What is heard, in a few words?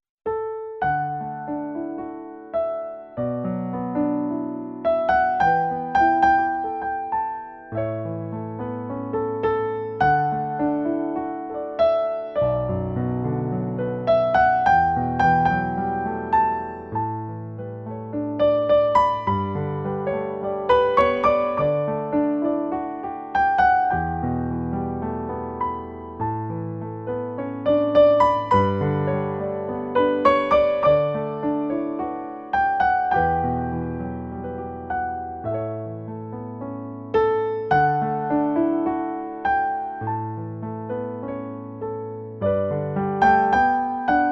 Banner Music